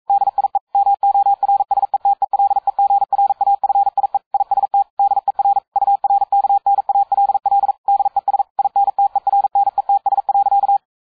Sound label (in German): Urknall 200BpM